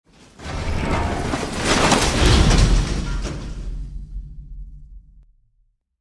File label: metal breaking